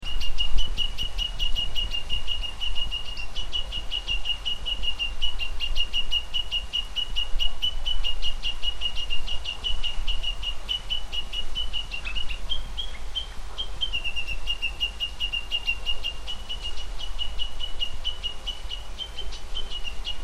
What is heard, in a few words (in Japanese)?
ウグイスが警戒している声です。